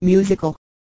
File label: musical